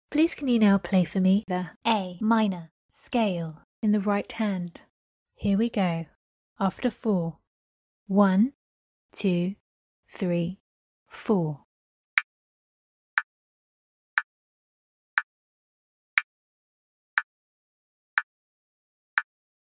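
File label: A minor scale right hand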